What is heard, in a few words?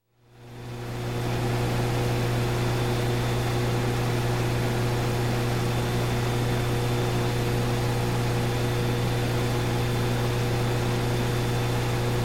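Power Plant Generator Public Address In Background, Factory; Sound Ideas 2000 Series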